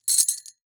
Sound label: Bells Jingle Sleigh Bells Single; Foley